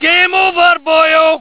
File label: gameover